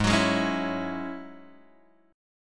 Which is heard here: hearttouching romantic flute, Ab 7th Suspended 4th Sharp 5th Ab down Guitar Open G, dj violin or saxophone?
Ab 7th Suspended 4th Sharp 5th Ab down Guitar Open G